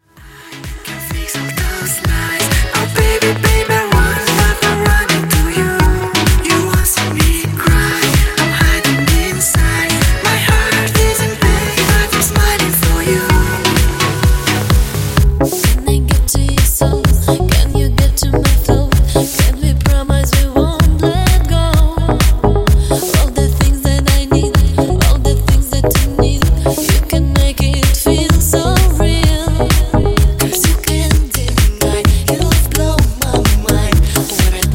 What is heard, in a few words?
Party Segue Edit 128Bpm